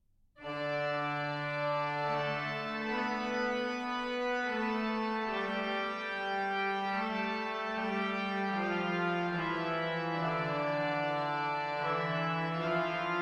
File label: Chaconne